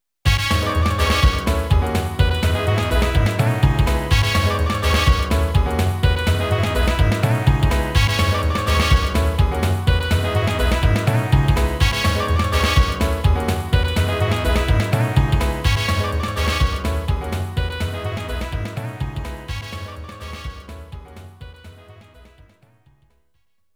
Winning; Vampire Sound Box; Darkstalkers; GALLON

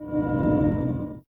Beam wall close

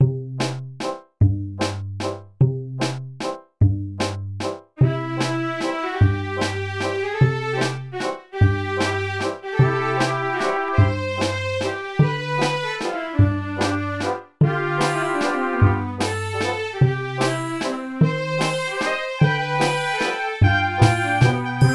BGM Waltz; Irodzuki Tingle no Koi no Balloon Trip; NDS

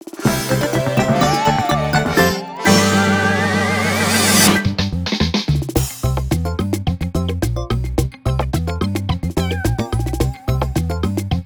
Victory!; Yo-Kai Watch 4 We're Looking Up at the Same Sky

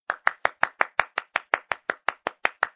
Clap; Akinator; Android

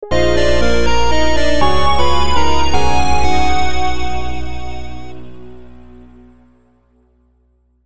Jingle, Orb Discovery; YU-NO A Girl Who Chants Love at the Bound of This World

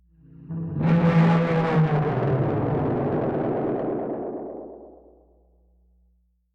Echobang sting; minecraft story mode gamerip 2016; A Block and a Hard Place

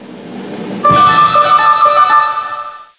Winning

